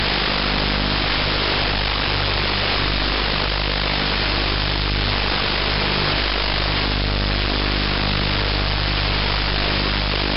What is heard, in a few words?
Unid 50Hz FMCW radar fm